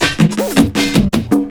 LOOP L, Drum & Bass 160 BPM

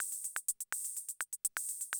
CR78 125, drum loops

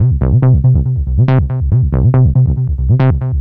NRG Bass, Trance

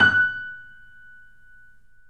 F#, Boesendorfer, 3D Pianos

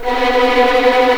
B3 TREM, VLNS TREMOLO, String; East West; The Ultimate String Collection

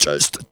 JUST, Funky Vocals; USB Soundscan vol.18; AKAI

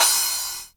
crash, Korg Electribe ER-1; Kit 22

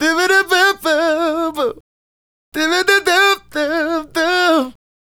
Male Solo, Hip-Hop and RnB Vocals

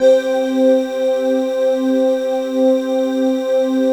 digi pad, Pads

